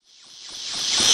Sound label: Sci-fi Approach